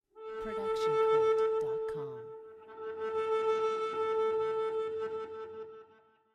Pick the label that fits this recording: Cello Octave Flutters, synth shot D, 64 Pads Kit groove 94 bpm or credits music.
Cello Octave Flutters